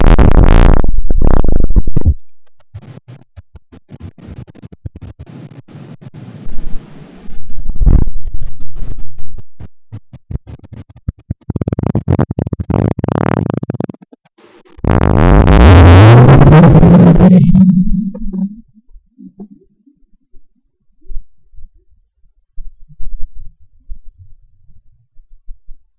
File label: doppler radar